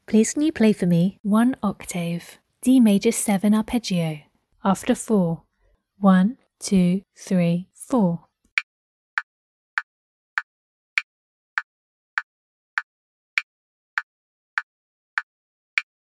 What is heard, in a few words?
d maj7 arp